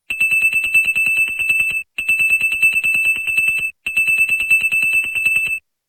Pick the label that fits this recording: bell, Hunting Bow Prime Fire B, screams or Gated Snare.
bell